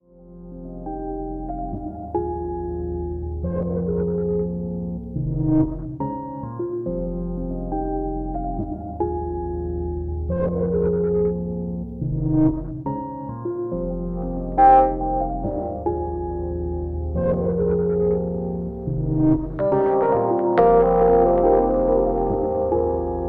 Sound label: Ambient Piano Loop Ambiance 140 Bpm